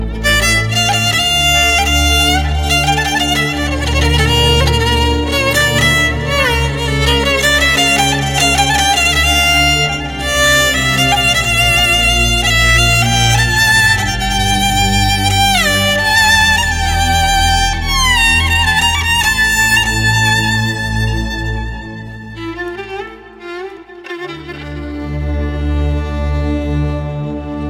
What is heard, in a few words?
Sad Keman